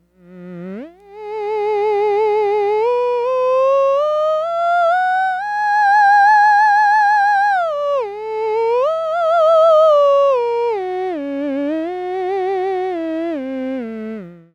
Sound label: theremin